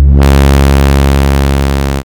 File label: hit; CloudInvaders